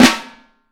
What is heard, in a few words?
FLAM, Vintage Snare Drum; Q Up Arts; Johnny.C's Vintage Snare Drum; 4X14 JCBOB